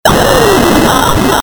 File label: jump; slender; zerosiki